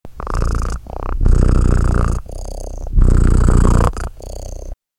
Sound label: kittens content purring